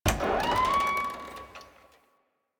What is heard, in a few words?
Tailor Shop Door Open; Warframe; NineteenNinetyNine